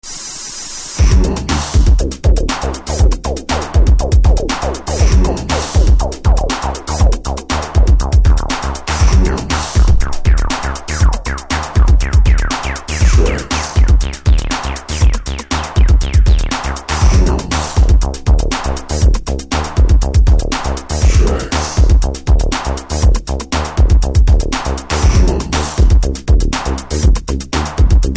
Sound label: drums